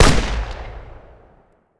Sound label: infantry fire standing